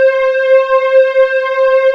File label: PAD C4, SYN PAD; MKS